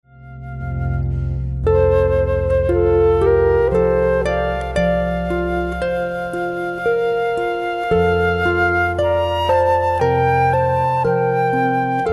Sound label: Minuet